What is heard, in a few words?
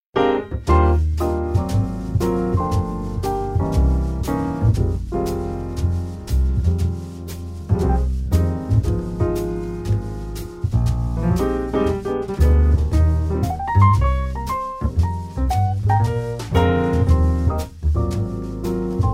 jazz; jazzfest